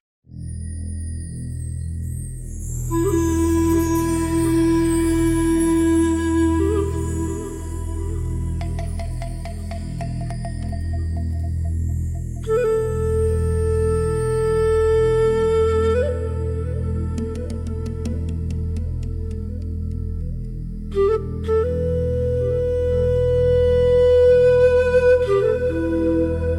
amazonian dreamscape a ethereal nature score, jungle ambience